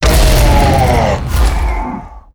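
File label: priest death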